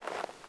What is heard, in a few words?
Leather Creak Short; Civ4 warlords